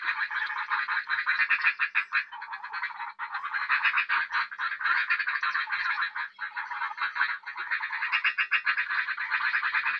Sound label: swamp; Ambient; ambient loops